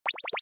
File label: Pitchy Perc